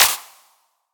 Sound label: Clap; M8; Electronisounds; Claps